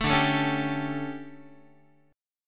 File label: D Major 7th Suspended 4th, Guitar